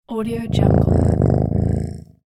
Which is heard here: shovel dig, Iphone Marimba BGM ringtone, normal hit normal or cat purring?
cat purring